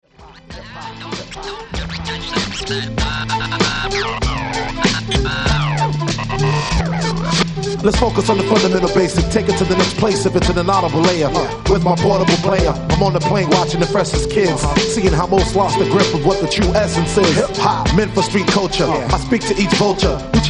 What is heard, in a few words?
Nylon Rhythm Machine, White Wind